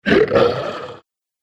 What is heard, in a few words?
Troll Leader Growl; Monster Sound Effects; horror